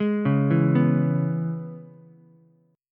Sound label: Db Minor 7th Db down Ukulele; arpeggiated; JGuitar